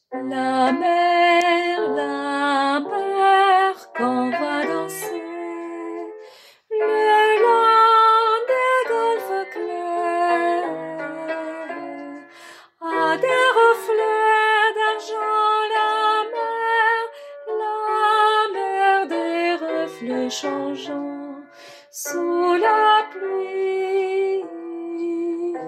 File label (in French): hommes